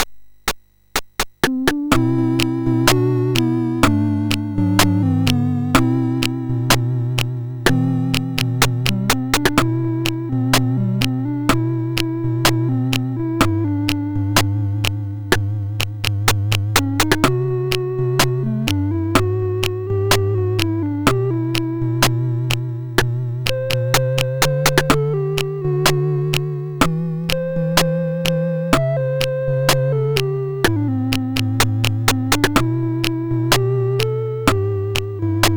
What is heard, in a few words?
Boardwalk, MOS6581R2